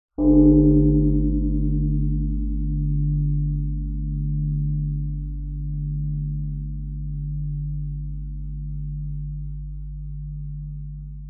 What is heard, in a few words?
bell very large strike mallet gong like